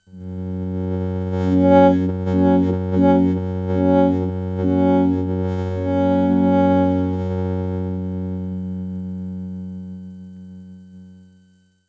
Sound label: Striso board Retrigger Fix